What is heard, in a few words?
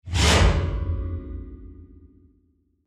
Scratch on a piano string